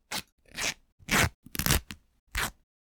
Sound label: Cloth Tearing And Ripping Flannel Cotton Scraps Close Mic Short Fast